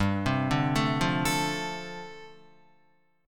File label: G 9th Suspended 4th, Guitar; G9sus4 arpeggiated